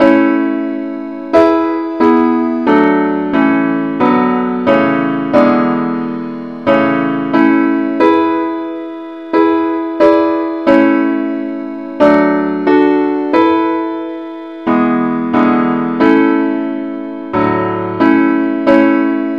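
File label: gwalchmai advance Accompaniment key a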